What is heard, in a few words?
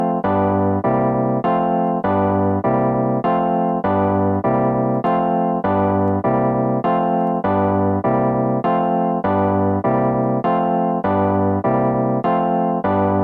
Rhodes